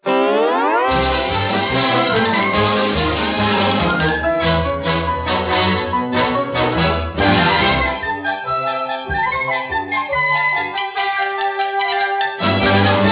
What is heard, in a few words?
toon open